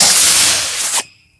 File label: door; EnterpriseB; TMP